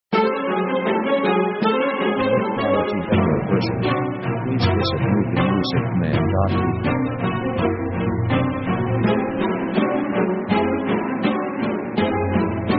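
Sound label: orchestral suspense action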